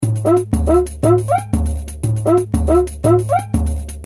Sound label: Cuica